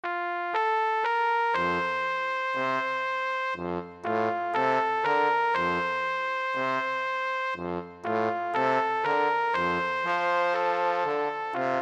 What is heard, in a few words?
when the saints, trumpet trombone duet